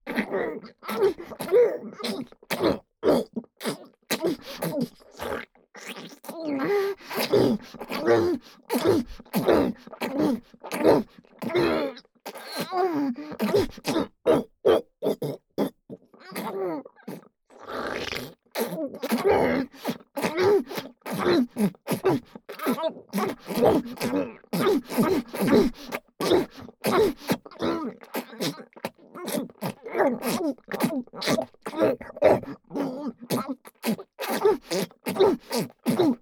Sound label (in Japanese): ダウナー 喉奥口フェラ; フェラ素材